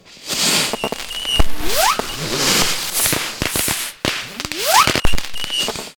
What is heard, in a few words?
firework show